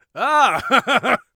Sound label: laugh, Warrior Voice; Warrior Voice Pack